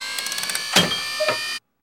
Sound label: Body Shakes Plastic, servos